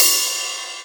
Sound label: Ride; dnb beat ableton